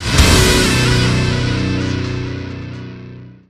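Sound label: stinger guitar hit; transformers war for cybertron gamerip; IACON INVASION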